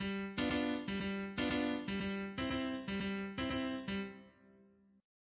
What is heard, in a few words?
bass